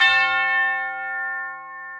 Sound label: Tubular Bells; Ableton; Orchestral Mallets